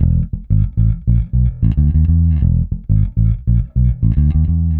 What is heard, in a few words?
Bass E Funk